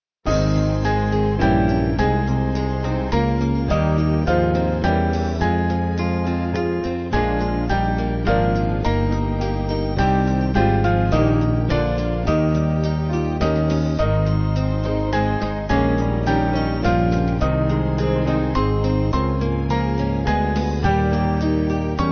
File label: Band, Music Backing Files for Churches; Great God We Sing; Germany